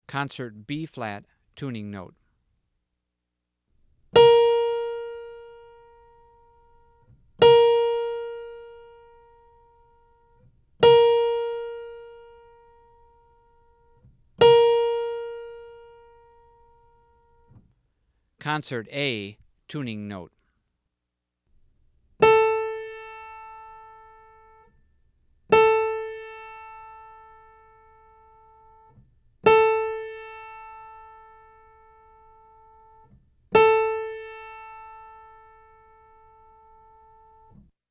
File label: Tuning Note; „Jamey“ Aebersold Play-Alongs